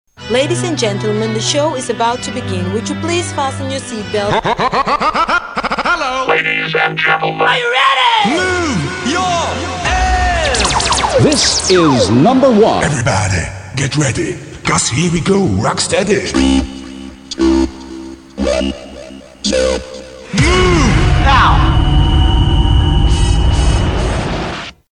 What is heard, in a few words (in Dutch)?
Kermis Jingle Mix; Kermis Mixen